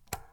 Fan button; Phasmophobia

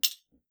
metal small; Phasmophobia

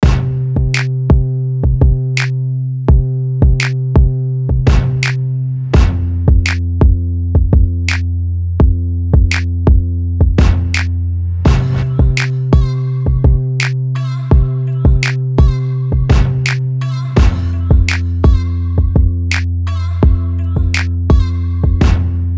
Thunder instrumentals

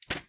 Tripod drop; Phasmophobia

